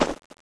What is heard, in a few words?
pat; Princess and the Pea; SoftKey